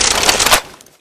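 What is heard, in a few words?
machine gun ammo belt off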